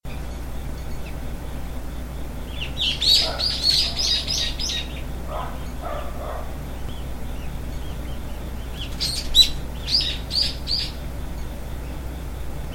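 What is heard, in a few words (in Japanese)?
kotoriga nigiyaka, lively small birds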